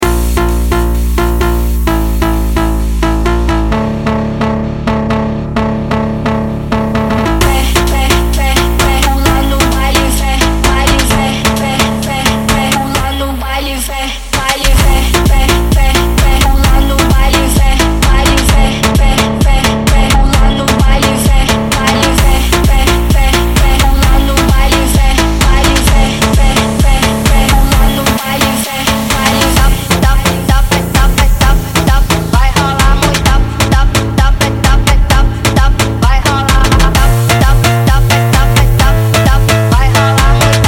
MONTAGEM PHONK

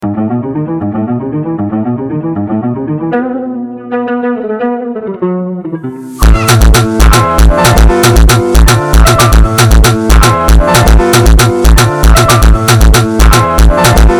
FUNK Sped Up

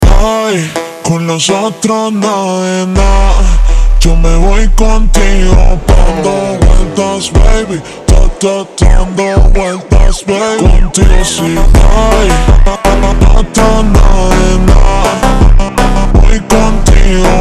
Mega Slowed

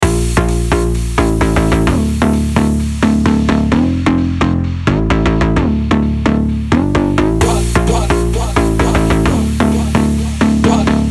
montagem phonk

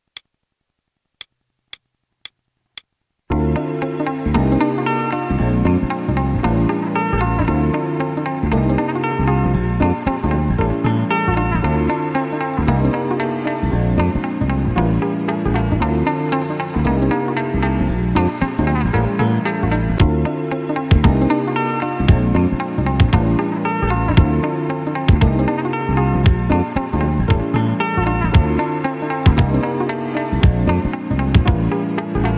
Intro Extended with Drums

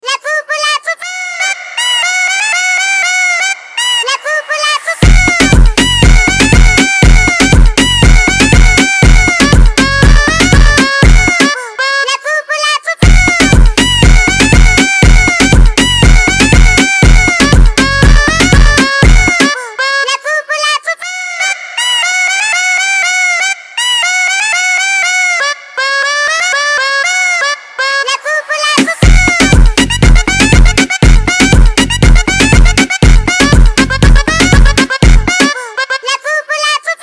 REGGAETON Sped Up

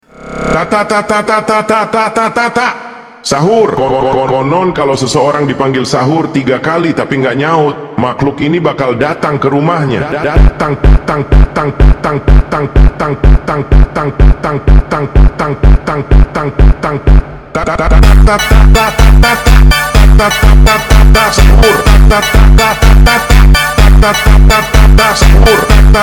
funk slowed

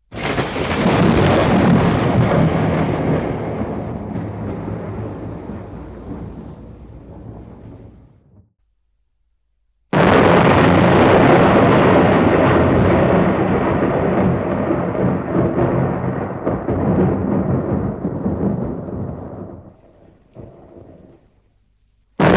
Thunderstorm Overhead; Ultimate Halloween

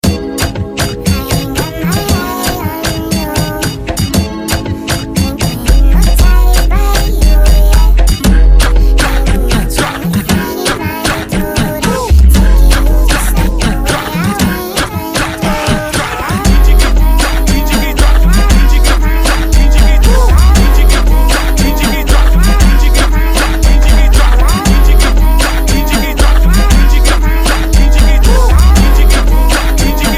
BRAZIL FUNK, Phonk Music

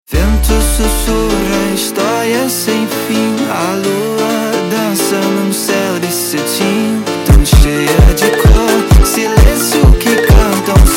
Hardstyle Remix

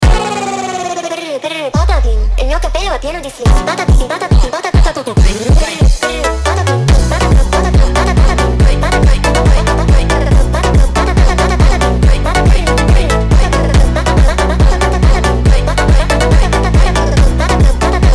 FUNK SPED UP

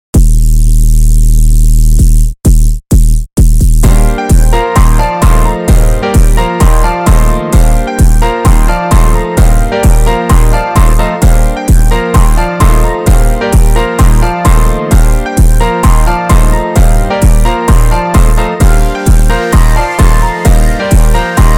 Instrumental Version

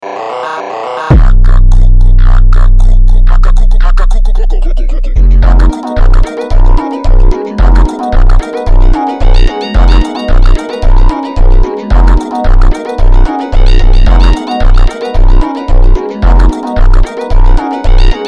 funk slowed

